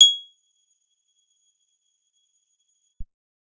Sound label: guitar acoustic, acoustic guitar nylon